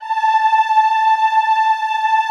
EHH A4, Classical Choir; Best Service

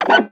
FRET, FINGER MUTE; Xsample VOL-1

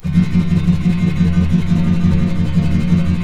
E M, Guitars; Spectrasonics; Hans Zimmer Guitars VOL-2; FLAM TREM CH

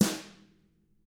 TAMA; ILIO; Double Platinum Drums 1

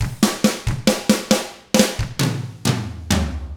SLOW ROCK, Drums; ILIO; Double Platinum Drums 1

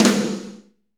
SNR, Snares, Roland

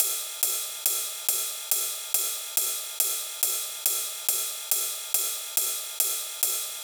drum Loop 140BPM Ride

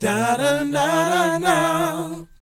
BOYZ B, Vocal Planet R&B & Dance; ILIO

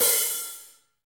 HH HH

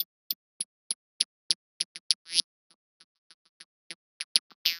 perc 100 rattlesnake, Percussive Loops 100 bpm

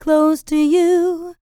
LICKS, Vocal R&B Dance; LT DANCE

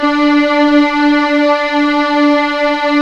D4 LEG F, VIOLINS; East West; The Ultimate String Collection

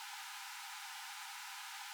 ORG, Farfisa

